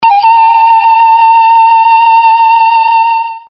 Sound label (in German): Panfloete